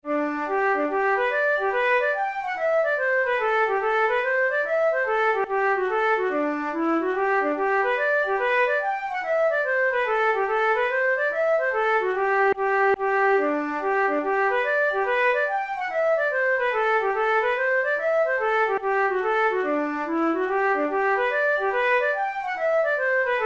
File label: hornpipe